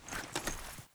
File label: rpg fidget; weapons